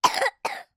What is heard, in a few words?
human 3 year old boy coughing